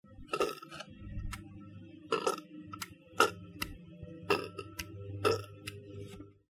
NOSE noise

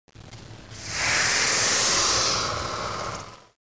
hiss

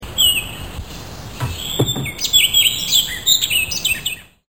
Orchard Oriole

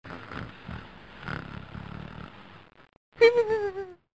snore

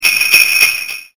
xmas bell

